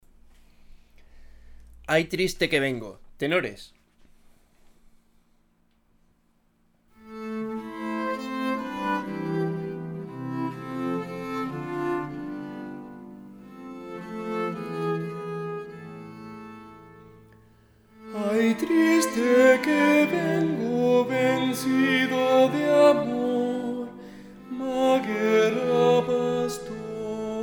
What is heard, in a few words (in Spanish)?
Ay triste que vengo tenores